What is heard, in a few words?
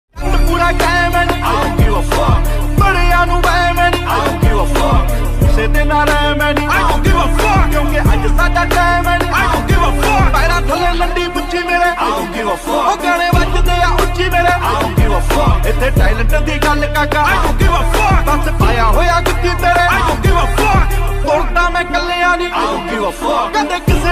DJ Punjabi Ringtone